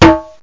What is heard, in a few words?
dholak3, indianperc; Octamed 6; walkabout